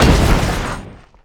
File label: thud